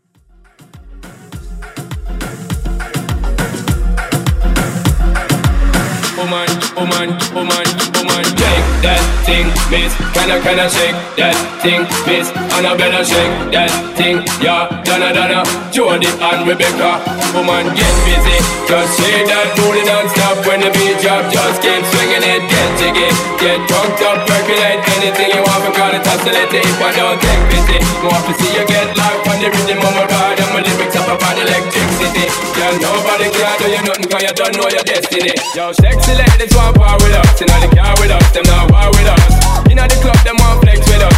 Transition

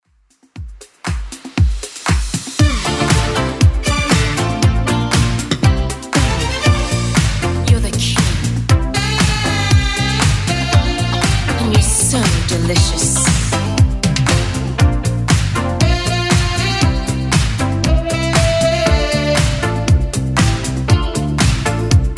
80s Club Edit 118bpm

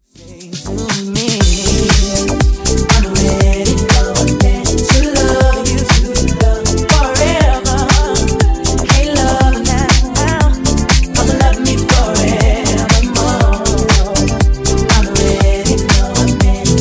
Disko Funk 120Bpm

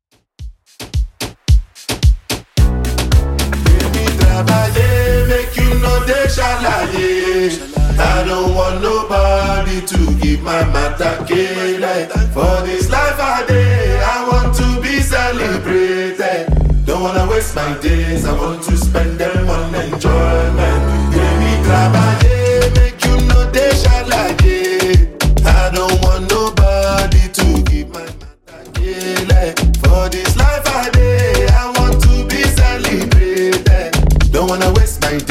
Moombahton 110bpm

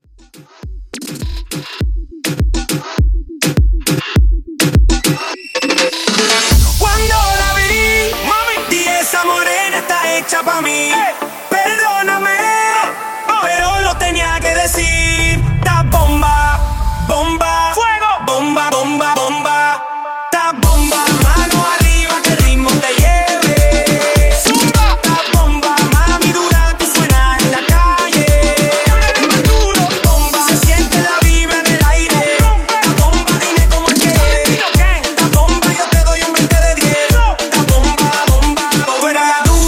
Moombah 102 Bpm

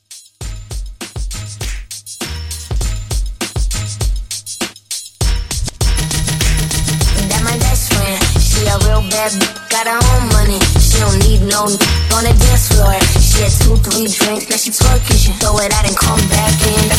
Mashup 100 Clean

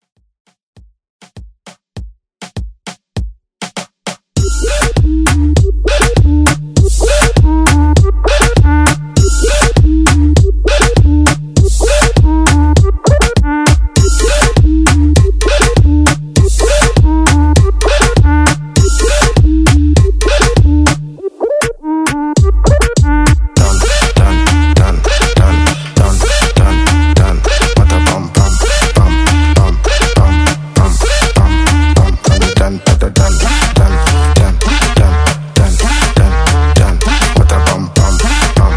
Moombah Redrum

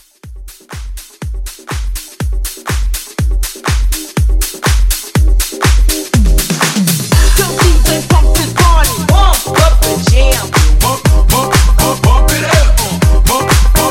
Club Hype Edit 122

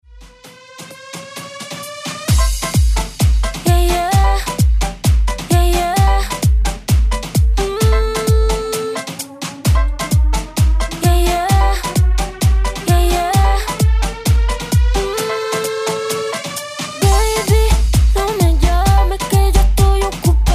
Latin House Redrum

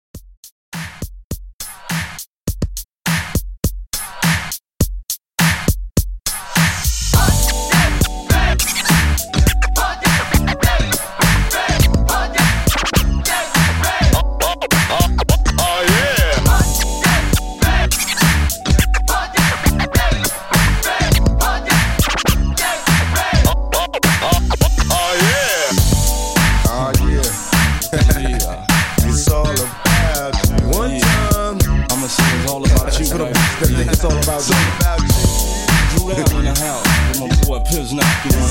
90s Hiphop Scratch 103; Re-Drum

